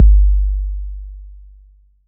808's

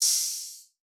Openhats Hard

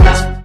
Hit

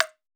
PERC

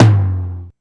Tom, LinnDrum

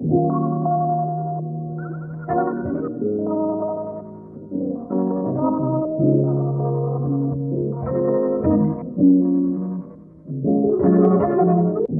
DIRTY BPM 80

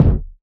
kick main

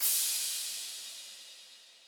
Crash

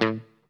BASS; 808s

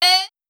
Chant

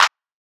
CLAP

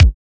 kicks

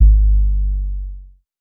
808 G#; Cymatics

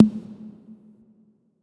Conga

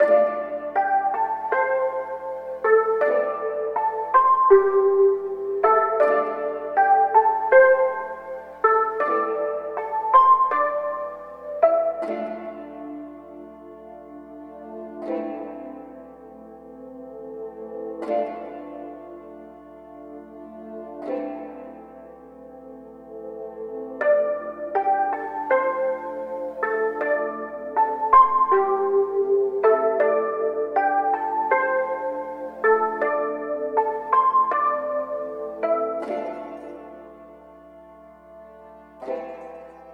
melodic loop inferno Dmin

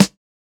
Snares

